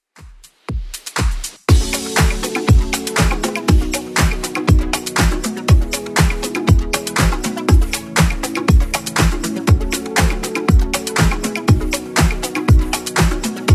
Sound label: 80s Club Edit 120 bpm